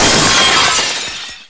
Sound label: bust glass, debris; XDM